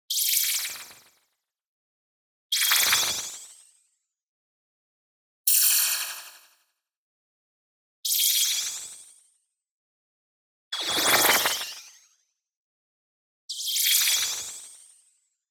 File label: Neutral Spectral; ZAP; boom library; Anime Essentials; DSGNMisc